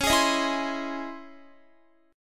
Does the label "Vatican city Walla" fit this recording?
No